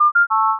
success; agentmag

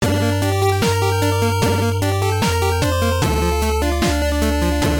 game over continue; asterix

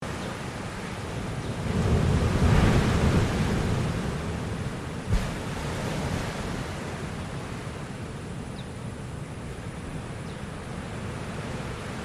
ocean waves

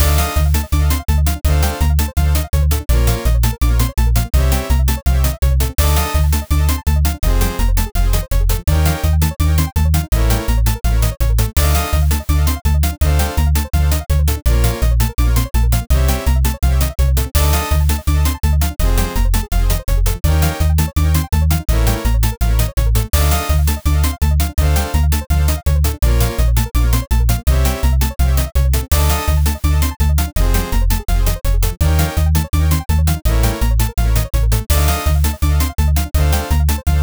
dungeon battle

